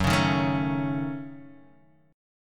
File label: F sharp Minor 6th F sharp Guitar Half Step Down